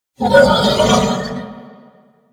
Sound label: Horse Vocal Screech Stopping; Warframe; Vehicles; Duviri